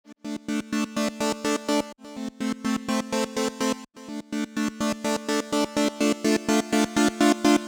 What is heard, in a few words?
Pulsator, 125bpm